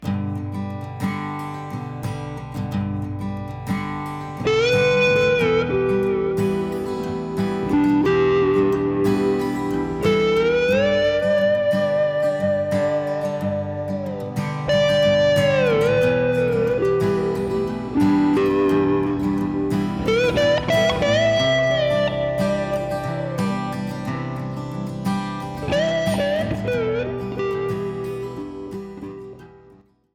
pulse

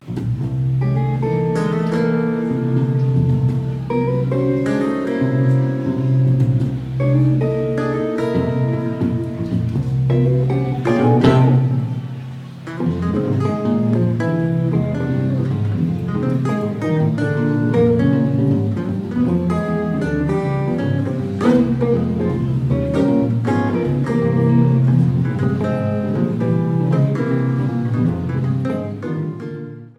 guitar

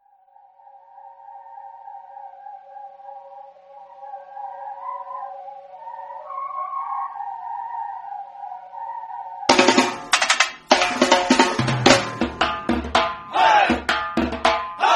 donkey beat

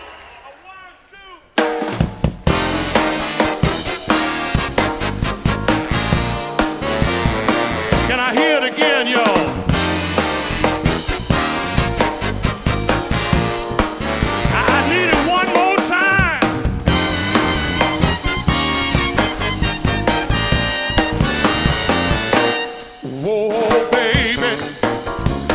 jazz blues

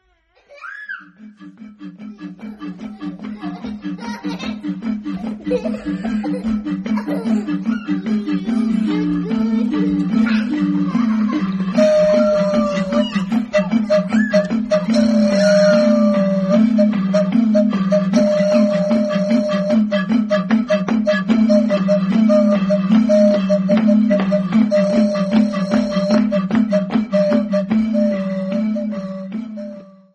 donkey beat

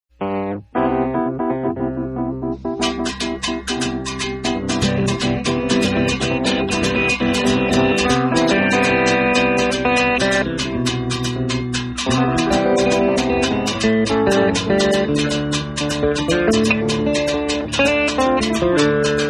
jungle

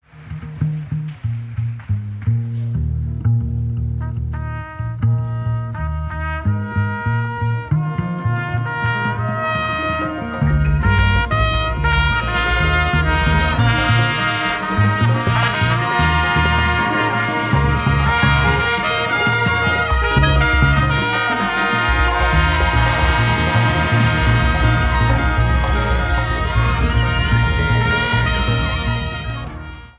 trumpet

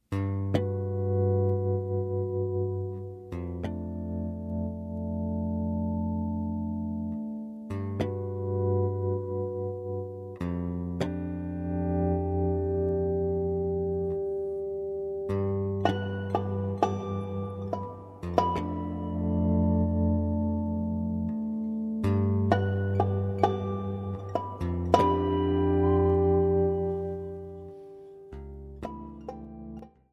jingle

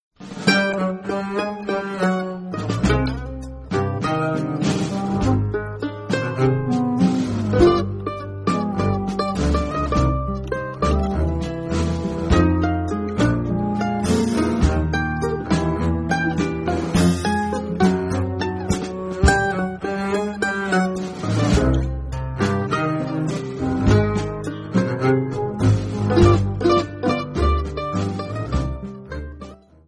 groove

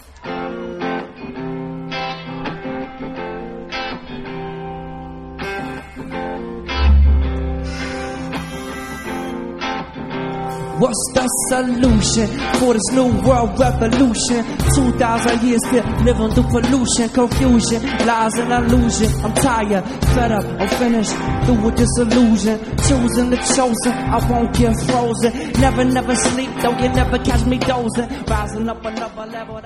glove jazz

